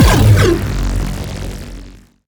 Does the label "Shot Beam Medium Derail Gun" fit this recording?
Yes